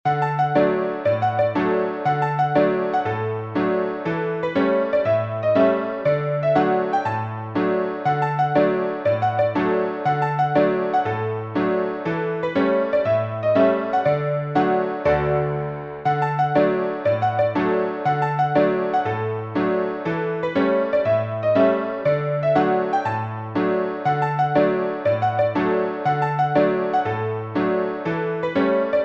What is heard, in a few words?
PIANO